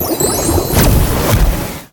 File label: gold, roulette; wbg games